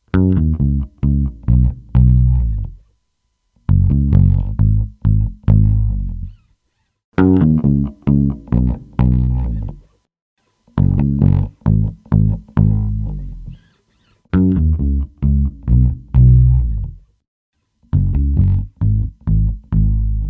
Bass; RingX

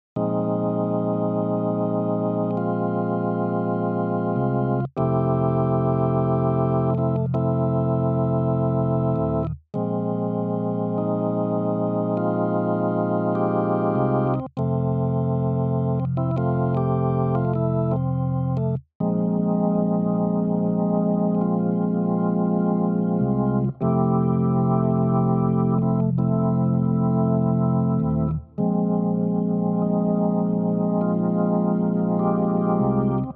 Choral

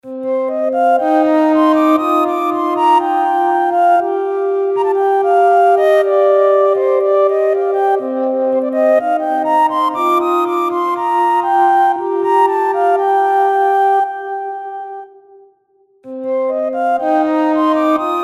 Flute; ChorX

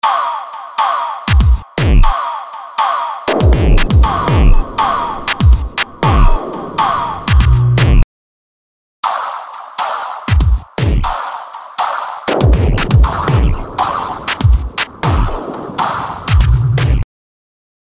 ChorX Percs

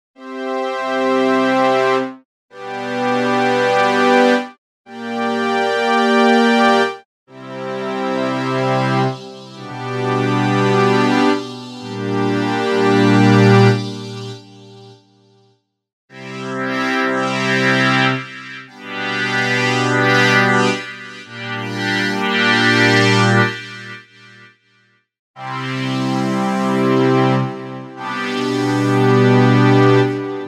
Strings; RingX

